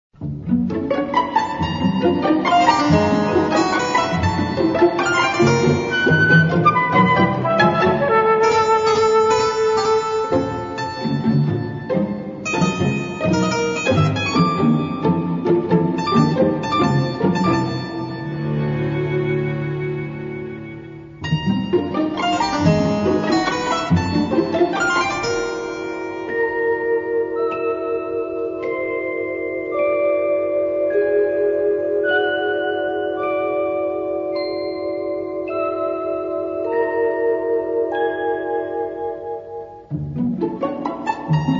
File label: Pizzicato